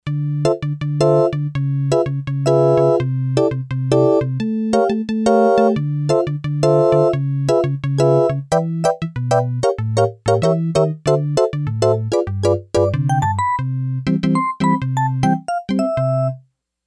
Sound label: Yamaha DX11 Sy. Organ 1; Factory Preset Voices